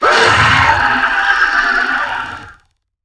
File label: emp screech; ling